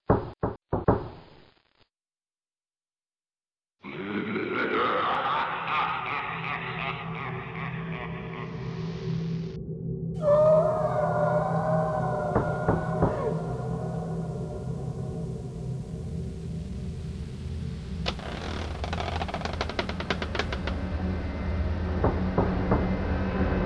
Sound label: Spooky; Soundscapes for Hypnos